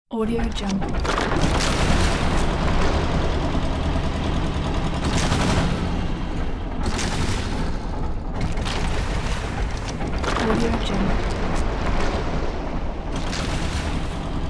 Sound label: cartoon vintage ripping machine; دستگاه قدیمی کارتونی